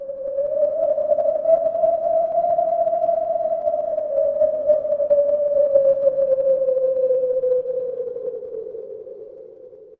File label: ambience wind